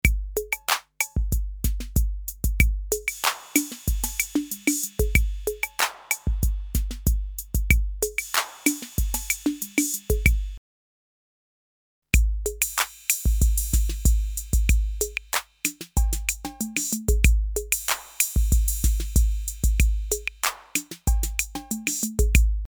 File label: TR-808 RD-8; Marvins